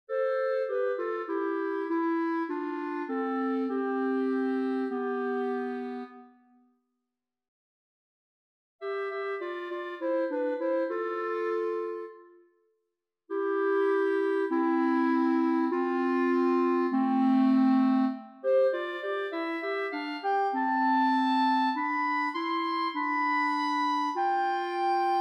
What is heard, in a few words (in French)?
CLARINETTES